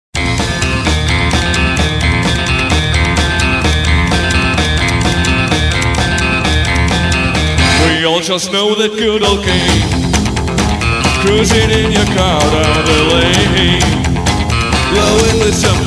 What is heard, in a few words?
rock city beat